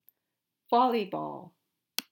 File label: volleyball